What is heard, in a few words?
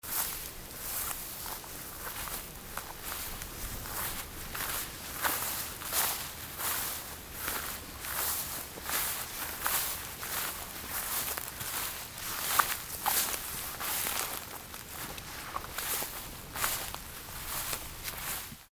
steps in grass